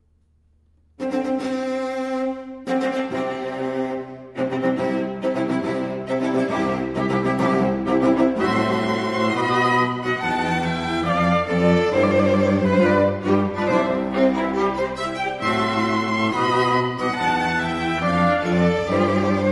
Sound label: wedding march, string quartet